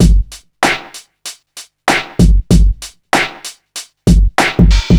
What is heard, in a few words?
96 bpm breakbeat sample g key, Free drum beat - kick tuned to the G note. Loudest frequency: 911Hz